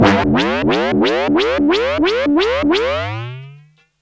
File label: ride, fx & sweeps